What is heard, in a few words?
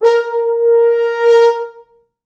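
4 French Horns; a#3; East West; Quantum Leap Horns Sax; 4 FrHorns fts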